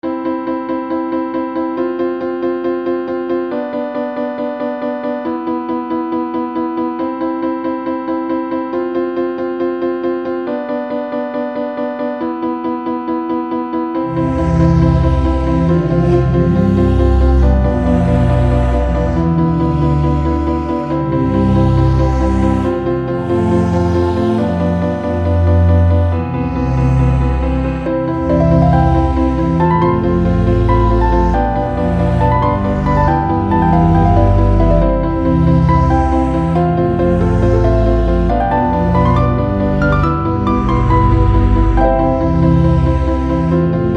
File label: Piano and Autogun